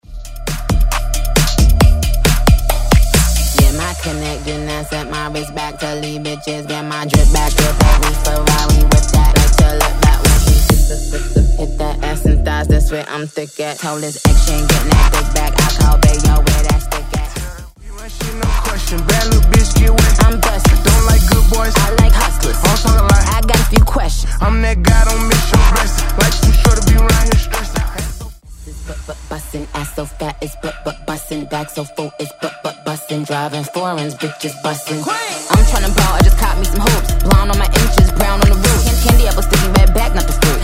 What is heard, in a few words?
Uptempo 135bpm; 7A